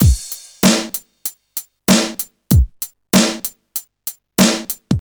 96 bpm drum loop c sharp key, kick tuned to the C# note; sample